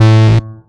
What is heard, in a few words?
106 Bass A2, One Shot Bass